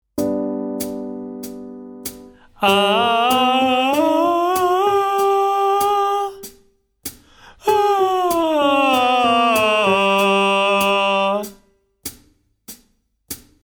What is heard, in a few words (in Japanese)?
ボイトレ ひっくり返る